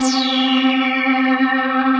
Synthesizer Middle C Notes, Lead

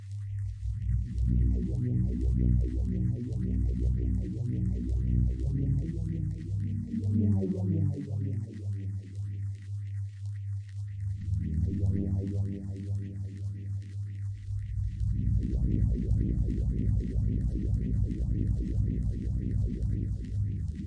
wobbly bass intro

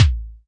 Pearl SC-40 Kicks

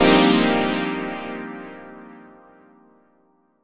stab satisfying, Melodic Stabs and Hits

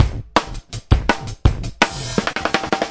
165 live phasecomp roll, drum loops and breaks

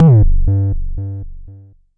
Jomox MBase, drum machine; Jomox MBase 01

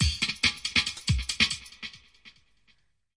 filto funko break, DRUM LOOPS and BREAKS; FILLS and ROLLS

